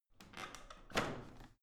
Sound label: washing machine drawer out squeaky soft